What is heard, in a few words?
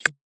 downworld turret bullet Impact; GONNER